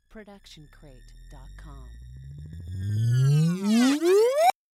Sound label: Laser Charge Up; SoundsCrate